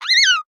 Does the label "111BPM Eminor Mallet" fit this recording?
No